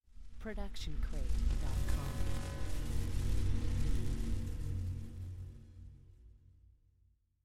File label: scifi swarm